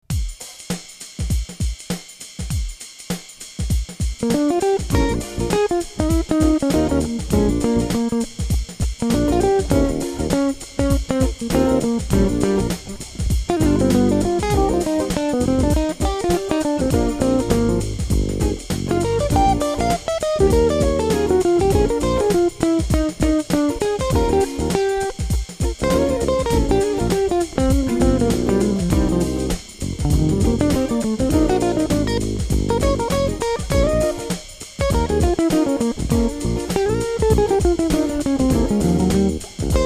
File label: funky jazz blues